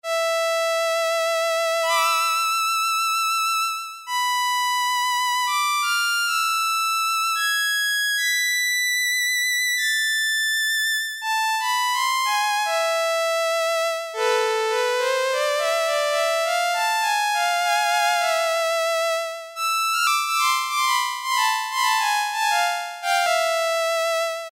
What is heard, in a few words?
Violine, Roland Juno-60; Factory presets